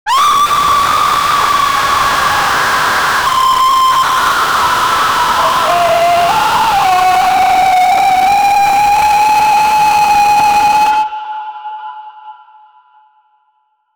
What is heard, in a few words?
robotic pain, robotics